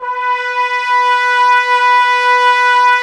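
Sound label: brass trumpets B; 90 s Sample CDs; Miroslav Vitous; Woodwind Brass Ensemble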